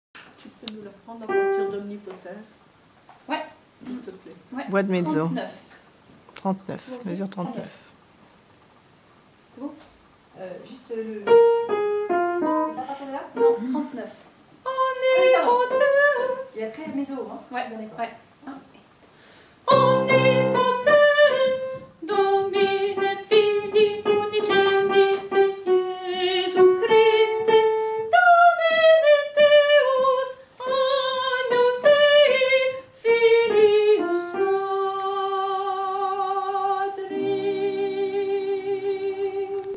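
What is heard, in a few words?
mezzo soprano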